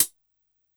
F#2 Analog HH Closed, Dance Kit; Yamaha PSR-E323; drum machine

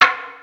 DR-660 FX; Rubber Chicken Software Sample Bank

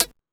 Techno F; EMU XL-7

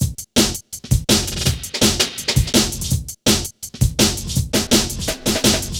Hard Stepz, Jungle, Drum Loops; Zero-G Jungle Warfare 1, 2 & 3; 90 s Sample CDs

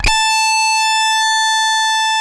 rock A4, rock guitar; InVision Interactive; Lightware VOL-1; Instruments & Percussions

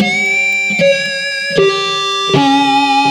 xtra lead descender; Sonic Foundry (Sony Creative Software); Ugly Remnants VOL-2

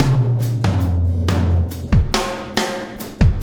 Landing Party Beat, Processed Drumkits

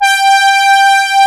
MEM MOOG G5; E-MU Formula 4000 Series Vol. 5; Protozoa; Vintage Presets

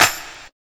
combi drums

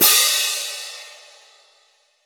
Concert Cym; Roland BK-5

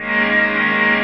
CHRD PAD; Best Service; XX Large Pads; Sample CDs

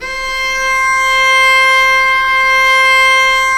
STR VC Arco Solo p

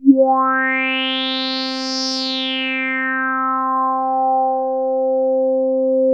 TS10 Analog, Synthesizers; Soli-Music; World of Synthesizers VOL-1

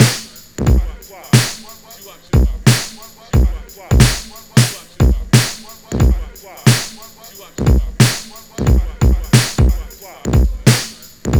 90 Live Drum Machine Steez, Breaks; Lockdown Breaks

